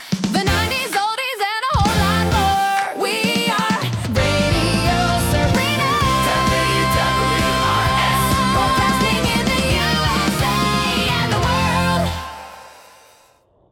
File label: Sung Jingle